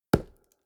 lumber tree

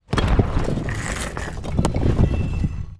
Stone Wall, complete; 0AD

